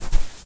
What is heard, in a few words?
Footsteps footstep sand run; SFX; Environment; ProSoundCollection v1 3